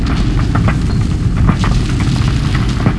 RMBL MID

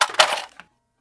famas mag out, weapons

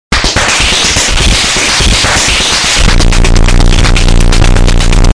slap

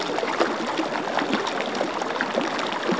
sewage

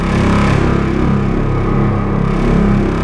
energy drown ambient

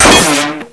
ric met, weapons

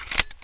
vp70 mag out

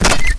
duck die

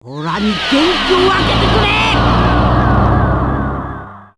spirit bomb charge

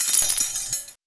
chain, weapons; pkm; paranoia

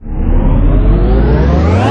start; fregat

